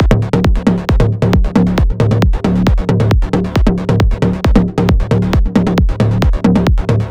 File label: melodic enchantment